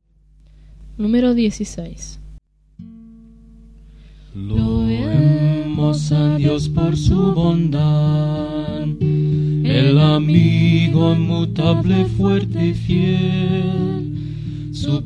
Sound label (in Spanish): Coro, himnario niños